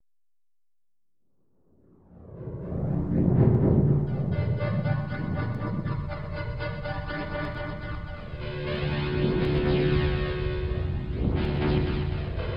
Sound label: Ab Locrian 60, Deconstruction